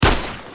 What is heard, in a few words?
Deagle